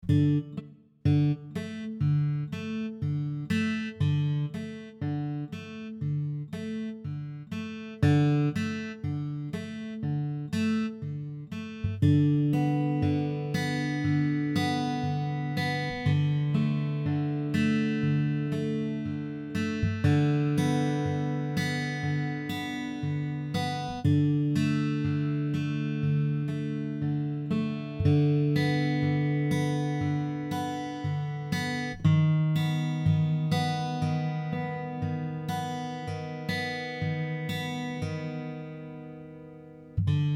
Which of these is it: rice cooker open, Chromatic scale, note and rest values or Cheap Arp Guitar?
Cheap Arp Guitar